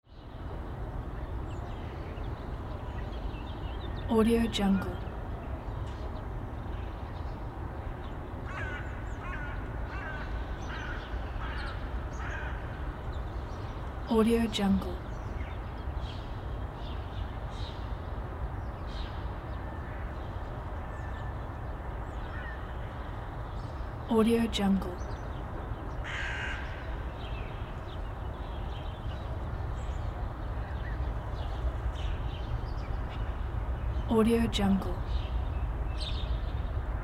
morning summer city ambience outside hotel window near sea